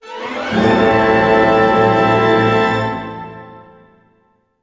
GLIS A MIN, gliss minor, orchestra; Sound On Sound - Peter Siedleczek's Orchestra

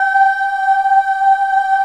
G4 child ah, choir; USB Soundscan vol.28

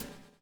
BUBL; Spectrasonics - Backbeat

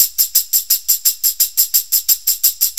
Tamb, Perc Loops; Drum Tools

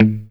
POP A 2, STRAT guitar; Spectrasonics; Hans Zimmer Guitars VOL-1; JC-STRAT POP

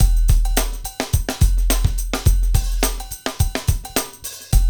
Hip Hop, Drumscapes Roland; Northstar

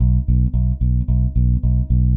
Eighth Notes B, Bass; Construction Kit; Bass X

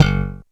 BASS G; Crypton - DrumBase5000; GO GO DRUMS

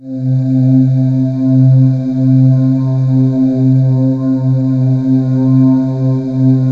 JV dark vox, JV-1080; Syntec; Wall of Sounds

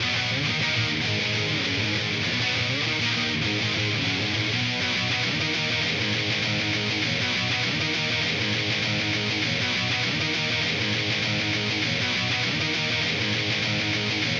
Guitar Loop from 90s, alternative; 99Sounds x Monosounds; Instrument Loops; Guitars

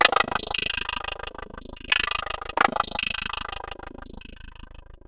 Ring Mod, Cymbal, contact mic; Murf & Ring Mod

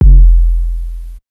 BD, Big Beat Drumloops; AKAI

